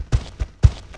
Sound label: Flying Lion Walk